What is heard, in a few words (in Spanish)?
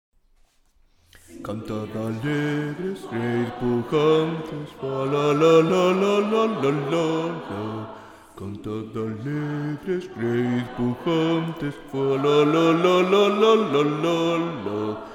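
canto de juventud bajos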